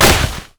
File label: basicattack; Ziggs; snowday